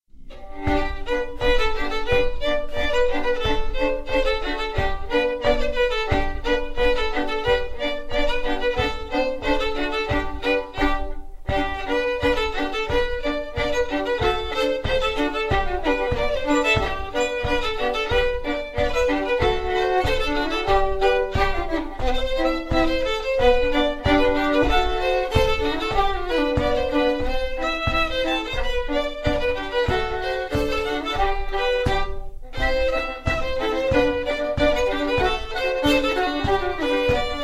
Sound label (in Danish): polka; mortens polka